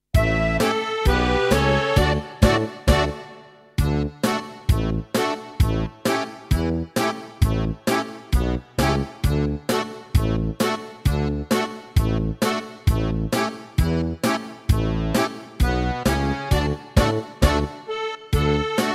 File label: Polka; Meespeel CD